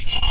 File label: Rock